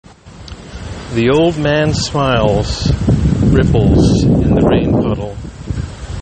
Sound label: ripples